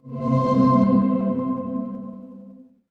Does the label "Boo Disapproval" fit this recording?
No